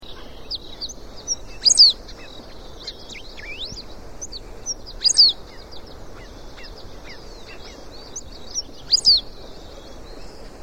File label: Embernagra platensis - Cotorrita de bañado